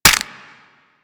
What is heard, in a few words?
Infested Minion Bite; Warframe; Attacks